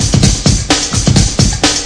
clanging banging rol, 128 bpm, drum loops and breaks